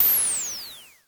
Dual-Percussion-Synthesiser; Klone

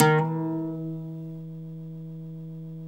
OUD GL SUP E

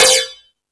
Crash, Drum Machines E-MU Pro-Cussion

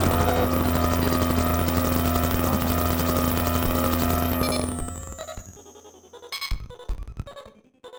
Battered Synth; malfunctioning synth samples

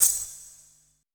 E SHELL, ETH PERC

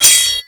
SW HIT, MORESWORD; Total Control - Action Cutz

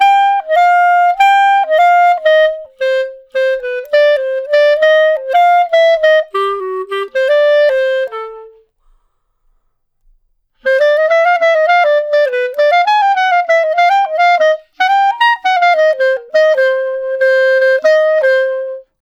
Clarinet One Shot, melody

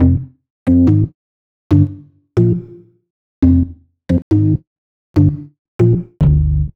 Orgbass Bass; Sonic Foundry (Sony Creative Software); Knutrix Drum & Bass

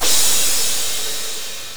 Crash Noisy, Drum Hits; Zero-G; Soundclash

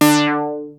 ARP 2600 D5, synthesizer; Hollywood Edge - Giorgio Moroder Rare Synthesizer Collection

